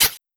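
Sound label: HH, hi-hat; Sonic Foundry (Sony Creative Software); Esoterik Beatz; Cricket; Criket Kit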